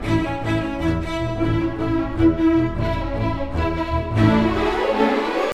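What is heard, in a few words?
ocean world music glouglou suspens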